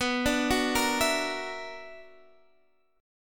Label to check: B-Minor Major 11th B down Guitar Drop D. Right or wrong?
Right